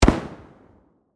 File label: Firework Explode, Bang; Fireworks